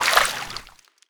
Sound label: water exit; player